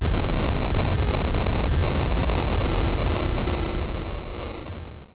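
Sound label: explosion, tank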